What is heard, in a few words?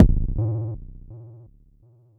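Mod bass drum, Jomox Airbase99, Drum Machines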